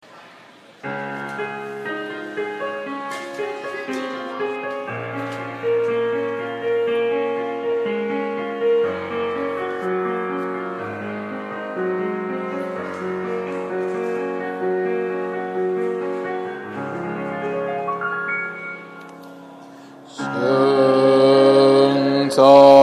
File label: prelude bass; 低音